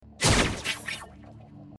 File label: sword shift; risk of rain 2